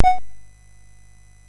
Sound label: sid MOS6581R2